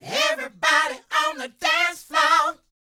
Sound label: LET, Vocal, R&B Dance, DANCE 120